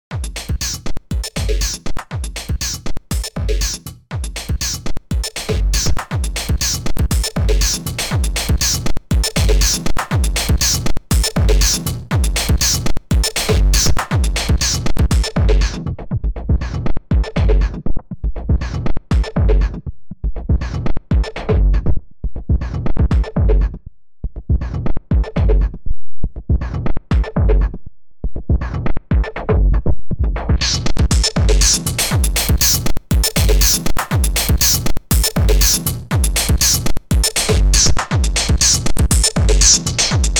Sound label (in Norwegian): trommer